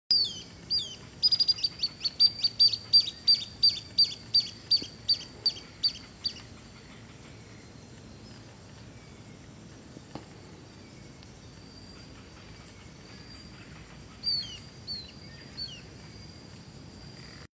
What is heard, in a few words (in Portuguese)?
Canto do macuru pintado